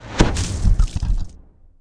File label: Hippogryph death